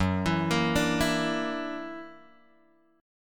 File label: F sharp Augmented 7th F sharp, chord; F#+7 Chord {2 X 2 3 3 0} arpeggiated